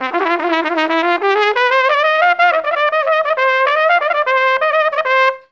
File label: ORIENT TRUMP; Best Service; World Colours